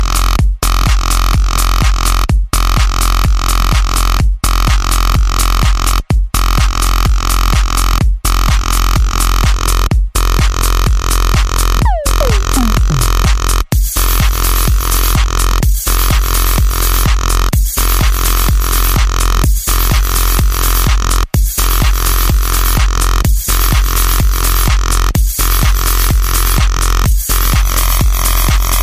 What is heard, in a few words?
Mobile Ringtone